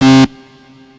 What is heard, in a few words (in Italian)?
errore